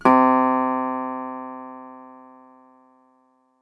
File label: synth